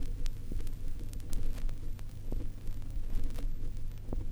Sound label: Crackle, Vinyl Noise